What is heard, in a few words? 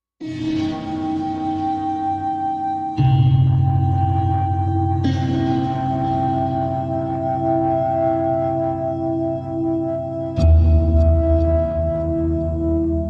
Enter Slowly High Tensi, musical